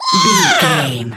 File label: goblin fairy attack